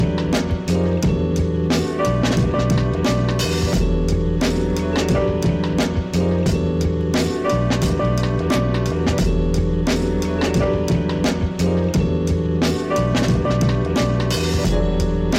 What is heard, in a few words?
Thursday Car (instrumental)